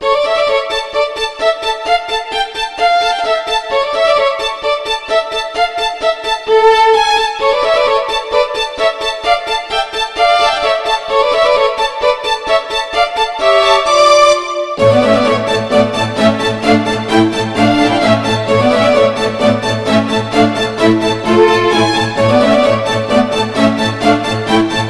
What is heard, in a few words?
violins; tomb raider 2; venice